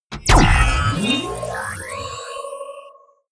sci-fi menu open heavy; energie

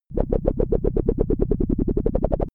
wobble; bewegung; comedy-cartoon; soundpool

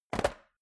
metal box small open; maschinen; soundpool

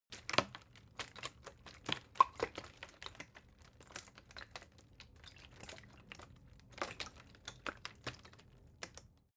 bottle water sparse handling; gastronomie; berufe

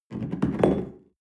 espresso upset tablecloth soft

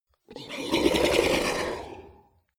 beast gargle large hard; comedy-cartoon; soundpool

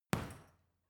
boxing punching bag side hook medium; sport freizeit; boxen

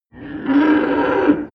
monster animal roar; kreaturen

